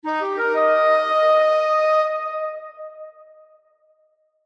Wind Instruments, Wind Instruments Maj Arpeggio Ascend; Woodwind; Blastwave